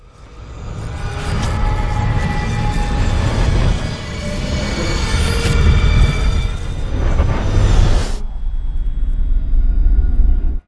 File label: broken noise